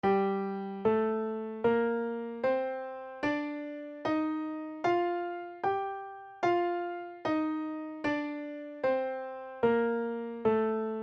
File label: tuneup g minor; intervals